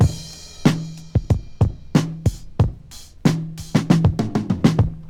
94 bpm drum beat d sharp key

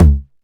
short tom one shot D key

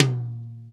wet tom sample a sharp key; tom drum

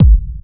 dry kick drum single shot g sharp key; tuned drums

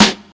good snare drum sample g sharp key; snare drum samples

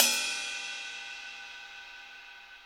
long crash cymbal single shot e key; drum sample

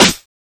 shiny snare drum f key; Mono; snare drum samples

